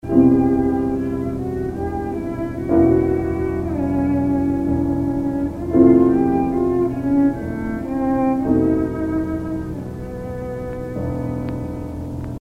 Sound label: Cello; Redunoise